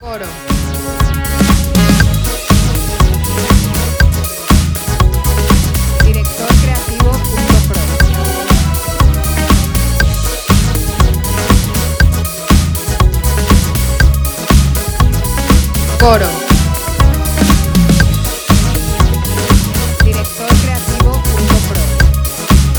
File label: 120bpm 4 4 B